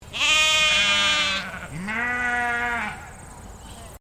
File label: Animal Sheep Dorper Group Baa; Sheep on a farm in Bright Victoria; Sheep Sound Effects; Sheep (Sound Effects:Animals)